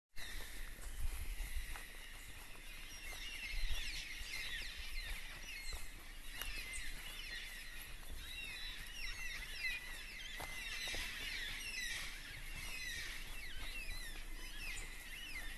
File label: californian redwoods; great ocean road; otways